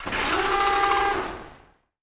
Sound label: servo big, sci-fi sounds, mechanical